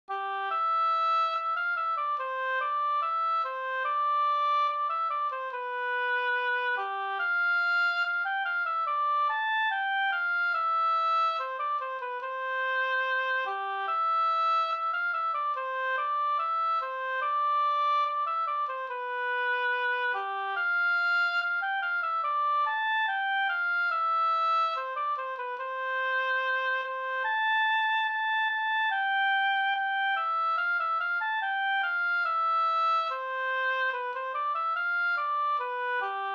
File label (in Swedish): brudmarsch, ganglat; Bjurakers brudmarsch Forsa